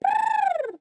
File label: kiwi exclaim